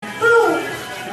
booo

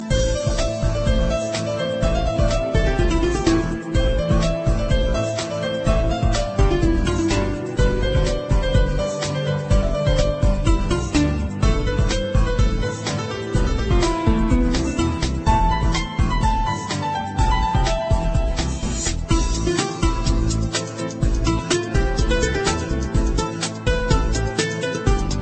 Piano N Guitar

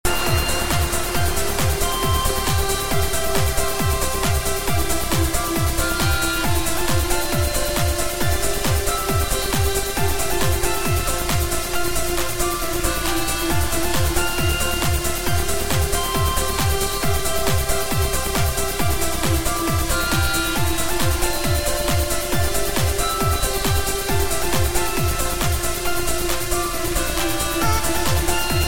Music

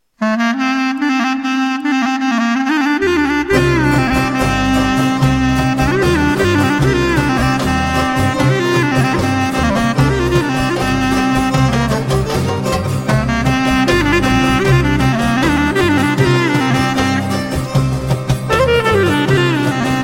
Klarinet

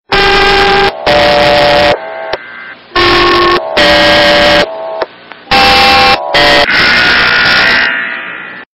Emergency Claxon

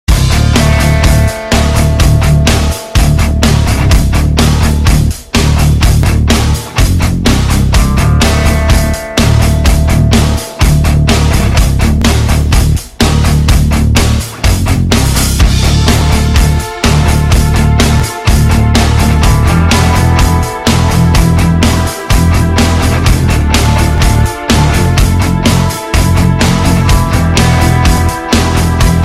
heavy metal

